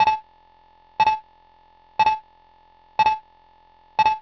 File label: 5 beeps